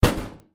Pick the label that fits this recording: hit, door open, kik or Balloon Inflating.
hit